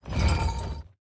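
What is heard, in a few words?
movement armor heavy; smite; god atlas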